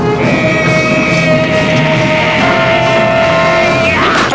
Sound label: battlcry